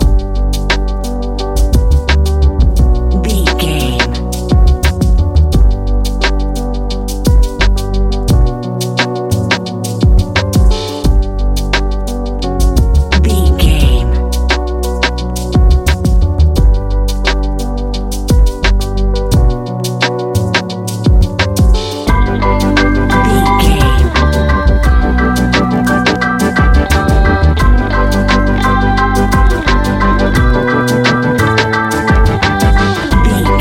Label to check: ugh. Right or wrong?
Wrong